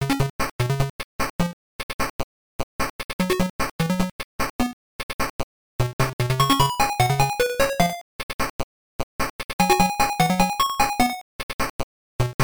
Level Start; Alex Kidd in Shinobi World

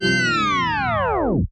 Tape Stop

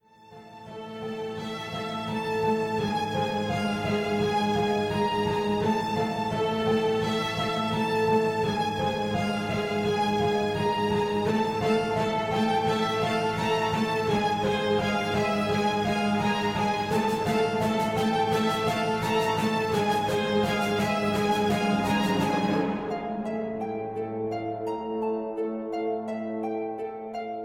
Pale Horror

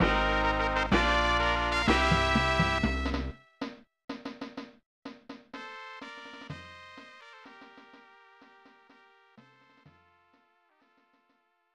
Victory!

